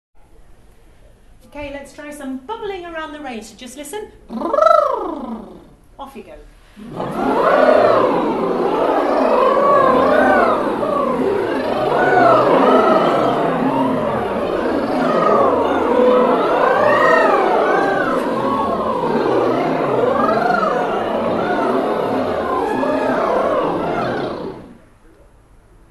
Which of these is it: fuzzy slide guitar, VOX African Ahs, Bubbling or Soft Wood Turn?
Bubbling